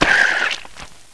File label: Atlantean Death; Playable Tutorial Level; TR1